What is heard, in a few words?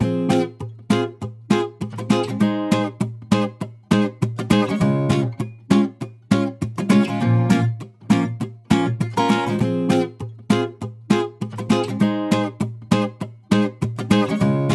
Happy Acoustic Pop 100BPM Bbm